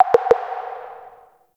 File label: Interior Orbital Station Telemetry Mid; Warframe; Tau